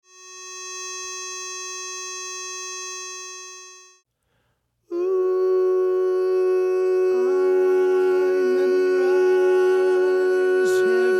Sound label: Baritone Predominant